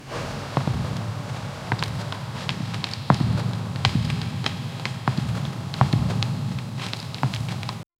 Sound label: soft chanting low hum of; hallway